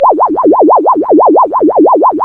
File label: C#, Synclav FM, FX; Big Fish Audio